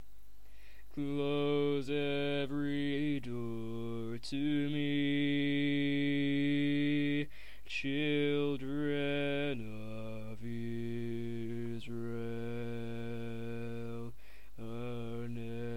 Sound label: Bass, barbershop